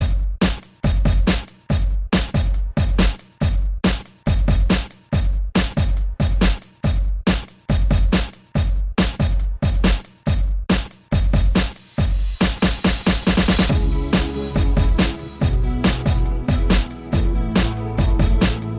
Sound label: Hold On Redrum 140